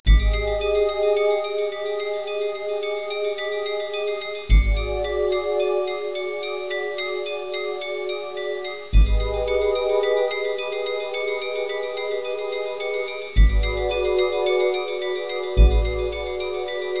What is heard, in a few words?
scary music